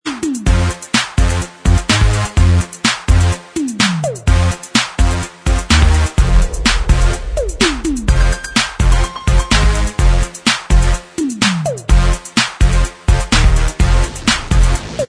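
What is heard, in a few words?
instrumental, track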